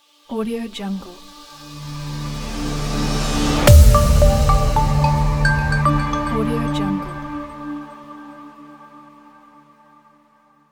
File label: crystal logo